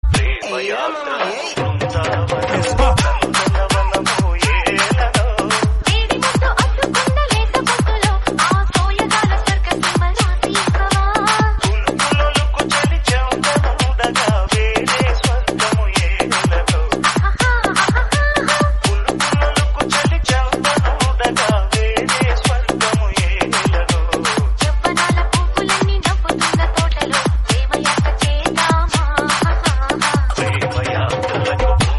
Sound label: dj remix telugu